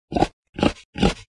Pig

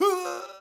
Male Death Cry

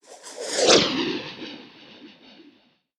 Rotating Air Whoosh

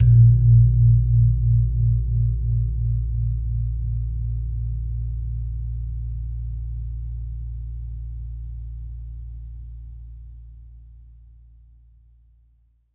Gong Wadon

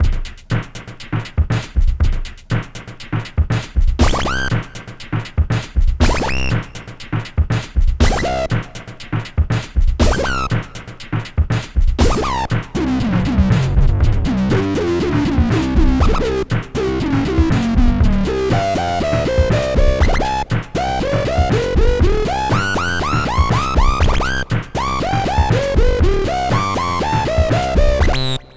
Ragamuffin Groove

